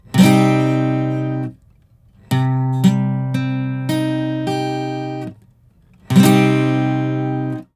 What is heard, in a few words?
Major or Minor